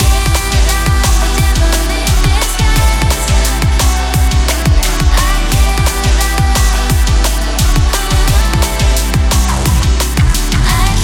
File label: DNB Master